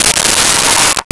Metal Slide

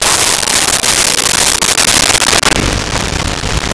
LoFi Synthetic Lion Roar; Vintage Cartoon; Comedy Vocals; SONY PICTURES SOUND EFFECTS SERIES

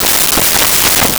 door open

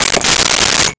Rotary Telephone Dial

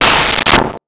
Metal Strike; Crashes

